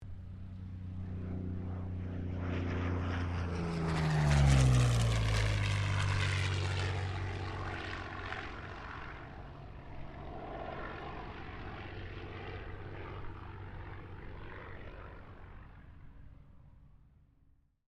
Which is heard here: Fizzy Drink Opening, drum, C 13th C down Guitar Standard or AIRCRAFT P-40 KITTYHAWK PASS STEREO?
AIRCRAFT P-40 KITTYHAWK PASS STEREO